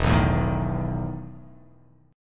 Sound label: E Major 7th Suspended 4th